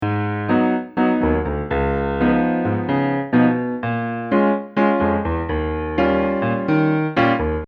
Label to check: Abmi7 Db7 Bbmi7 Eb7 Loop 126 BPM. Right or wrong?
Right